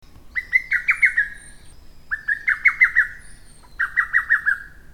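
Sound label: lesser cuckoo; hototogisu; kigoyama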